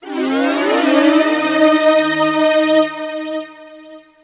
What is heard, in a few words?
School Alert